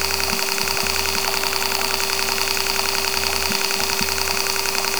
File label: digital camera